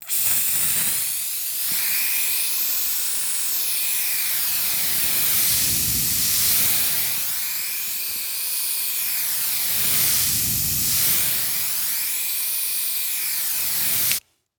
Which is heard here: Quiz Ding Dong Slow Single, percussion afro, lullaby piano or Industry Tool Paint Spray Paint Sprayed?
Industry Tool Paint Spray Paint Sprayed